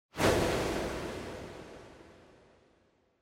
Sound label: Camera Motion Reverb; カメラモーション; リバーブ; シーン・カメラモーション